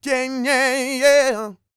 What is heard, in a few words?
SING, Vocal Planet R&B & Dance; ILIO